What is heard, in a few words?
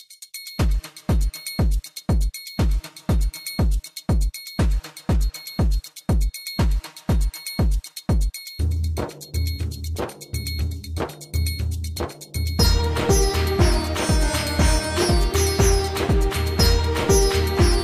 Indian Real Music Ringtone; ringtones